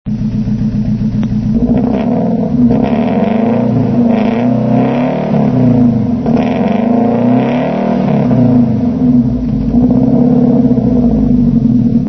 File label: boxer